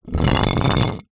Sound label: household glass jar containing water short roll concrete